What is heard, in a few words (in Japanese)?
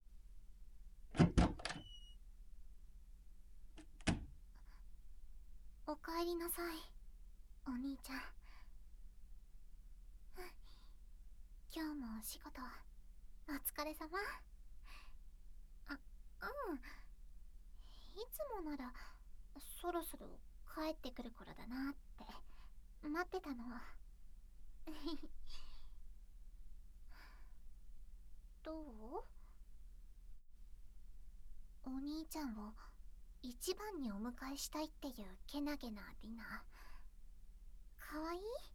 今日は耳かきで癒してあげるね